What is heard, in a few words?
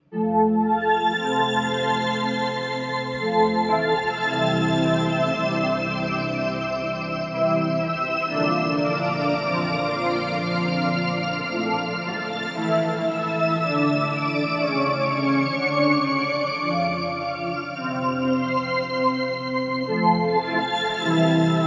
Pad; Boss GT-1000 Core